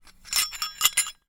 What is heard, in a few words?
Hole Saw Rattle, Hole Saw Bits